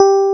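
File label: electric piano; Greytsounds; Scott Peers Sound Engineering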